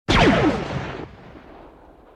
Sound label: laser